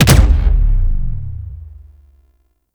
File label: Gunbrella Alt Punch; Weapons; Warframe; Tenno; Koumei